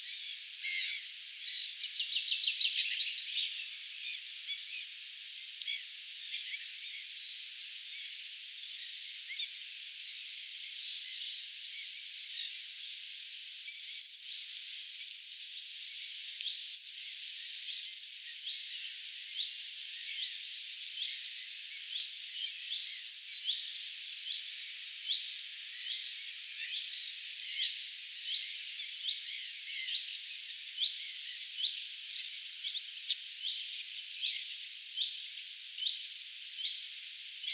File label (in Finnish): onko tuo sinitiaisen laulua